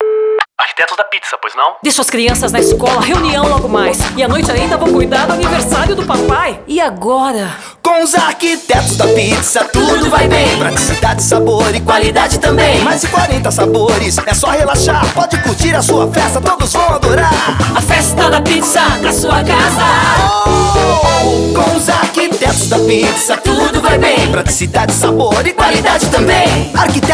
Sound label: JINGLE; ARQUITETOS DA PIZZA